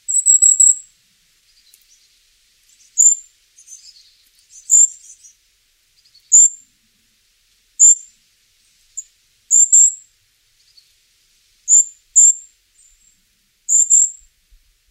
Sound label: golden crowned kinglet